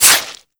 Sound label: knife slice; weapons; melee; Modern Warfare